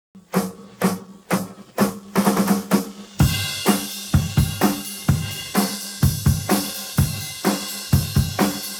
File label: pattern, drums